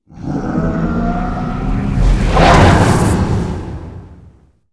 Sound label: lich attack, monster; rigel